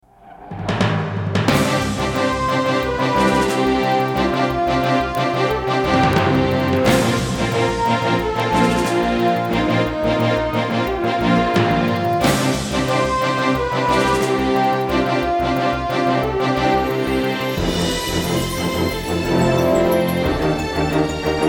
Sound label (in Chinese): 电视包装音乐